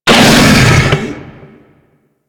Infested Bone Blade Vocal Mortar; Warframe

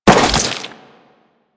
Crewman Step Flesh, Footsteps; Warframe; Corpus

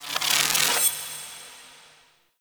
Sniper Shot Charge Ready; Powers; Hunter

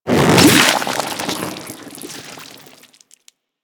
Fungus Explo; Warframe; Ambience; Venus Open World; Venus Landscape

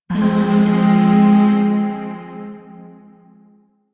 Shawzin Min Chord G; Music; Chords; Warframe; Zariman Void Shawzin; DE

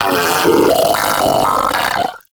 Arachnoid Vocal Patrol; Enemies; Vocals; Warframe; Corpus; Arachnoid Hunger

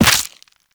Operator Run Bone F, Footsteps; Warframe

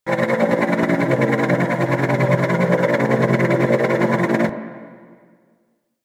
Surveillance Drone Loop; Warframe; Grineer

